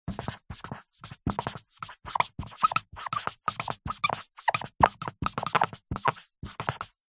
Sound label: office whiteboard pen writing hard